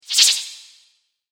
Dexterity Spell, magic sound effect; Magic Sound Effects; fantasy sound effect; Andrew Fleming

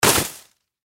Snowball Impact Hit; Ice; Weather; Ice Sound Effects; Blastwave

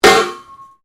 METAL PIPE IMPACT; Metal Sound Effects; Metal (Sound Effects:Materials)

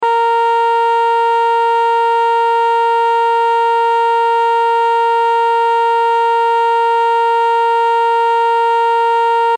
Horn Long Sustain; Transportation Automobile; Car Sound Effects; Blastwave

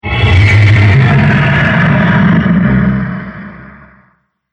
Demon Of Distortion, Monster Sound Effects

